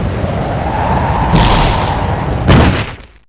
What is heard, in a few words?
wind